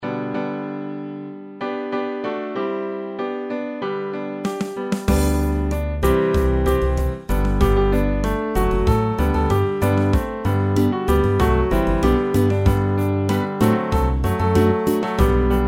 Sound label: Piano Sundowner; tropical heartbeat; Tsetse Music